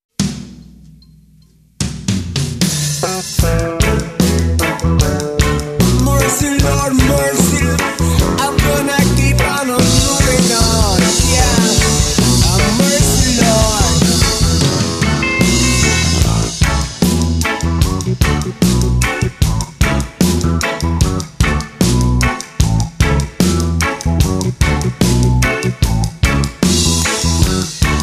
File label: reggae